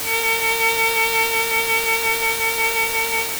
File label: Noisy Vibrato